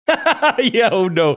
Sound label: laughing saying yeah oh no